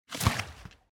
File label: card Flip; 1casino